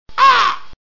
corbeau grand, chant

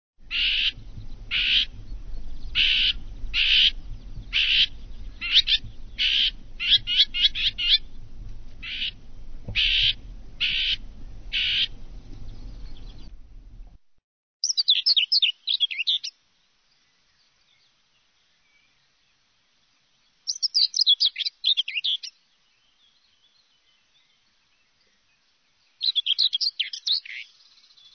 Fauvette grisette, chant

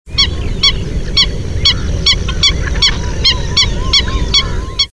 echasse blanche

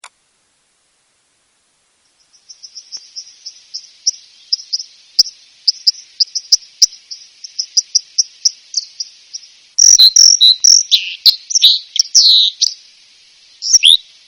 cincle plongeur

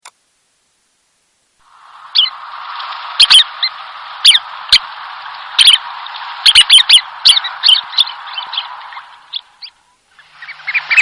becasseau sanderling, chants

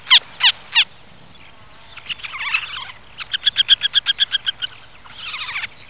busard cendre Circus pygargus

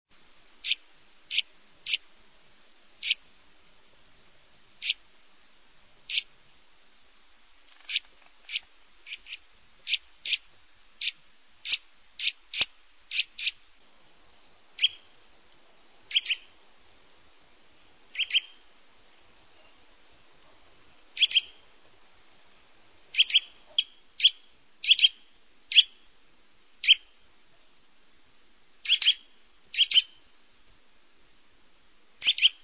Sittelle torchepot, chants

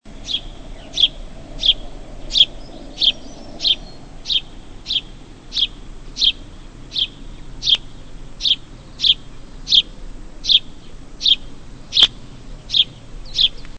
Moineau domestique, chant

